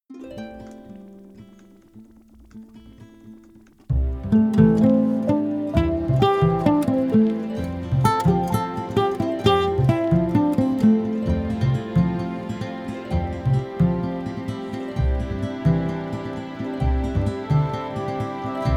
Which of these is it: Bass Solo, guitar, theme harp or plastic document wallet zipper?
theme harp